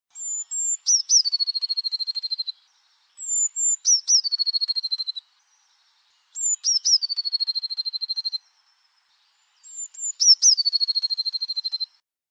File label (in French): Mesange bleue